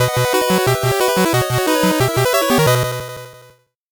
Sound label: 8bit jingle fast